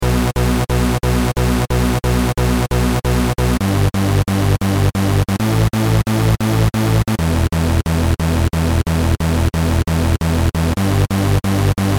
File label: Synth stab [Syn Oct Lead]